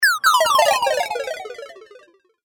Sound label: Game App Falling Fail, Video Game Sound Effects